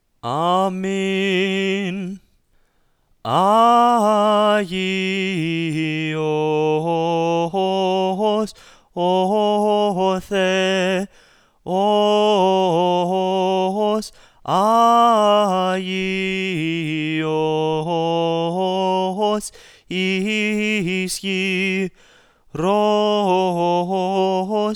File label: Stage Close Mic; CCRMA